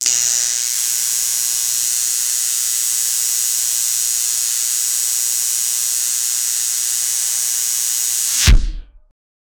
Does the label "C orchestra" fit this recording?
No